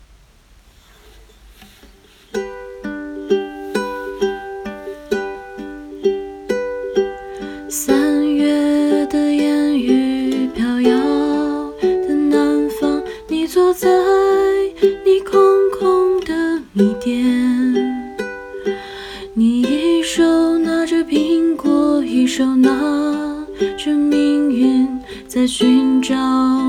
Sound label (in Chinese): 尤克里里弹唱